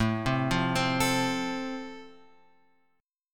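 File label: A Minor Sharp 5th, chord strum; Am#5 Chord {5 3 3 5 X 5} arpeggiated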